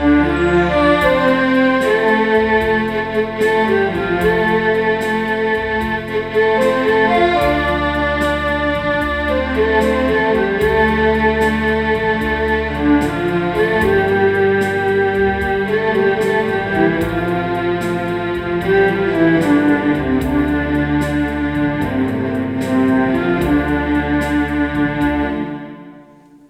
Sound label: enka motif